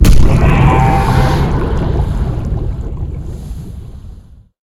hit sea animal; Blind Drive

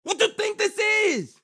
town human far; Blind Drive

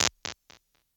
282 Delay TAPE, Impulse Responses; Reverbs; PastToFutureReverbs; SST; CLA; IRs

